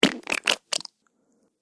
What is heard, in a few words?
Ooze Blob Drops And Scatters, slimy; Slimy Sound Effects; Andrew Fleming